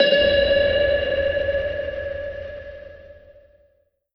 Perc FX Martians, 909; Slate; Vintage Drum Machines Volume 3 909 Edition

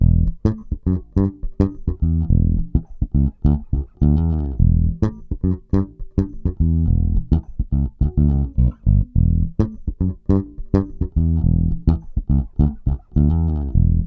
Bass loop 105 E, Electric Bass Loops Direct; Slate Digital BASSHOLE Sample Pack

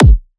kick thump; Drum Sample Pack; One Shots; Slate; SEISMIC

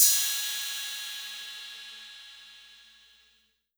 Cymbal, 808 Cymbals; Slate; Vintage Drum Machines Volume 2 808 Edition

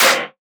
snare trashcan; one shots; SEISMIC Drum Sample Pack; Slate

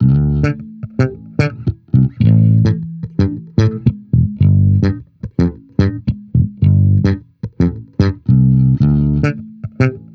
Amped loop 109 Dm, Electric Bass Loops; Slate Digital BASSHOLE Sample Pack

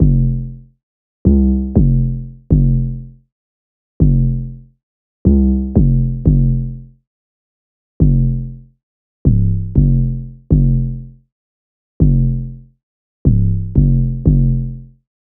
808 loop 120 F; Slate Digital BASSHOLE Sample Pack; 808 Loops

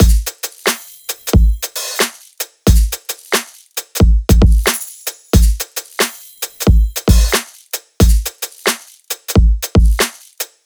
drum loop 90, Pop; Drums; Full Drum Loops; oakdale; Slate; SD PASADENA Pop Sample Pack

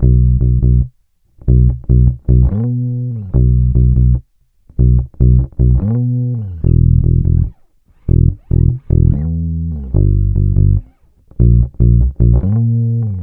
Bass loop 145 Bm, Electric Bass Loops Direct; Slate Digital BASSHOLE Sample Pack

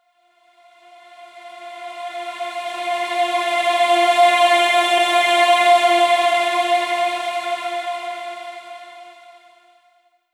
reverse, vocal sample; Slate Digital Omnivox Vocal Sample Pack

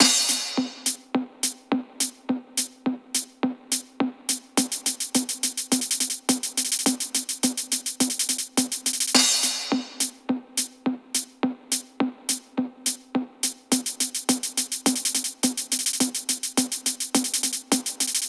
perc 105; Drum Loops; Top Loops; SD MIDNIGHT Sample Pack; Slate

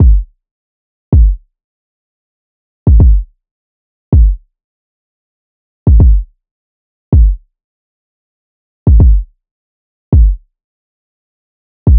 kick 80, Kick Loops; drum sample pack; Dempo; SEISMIC; Slate

